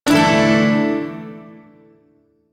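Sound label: Shawzin Pentatonic Major Chord; DE; Warframe; Duviri Shawzin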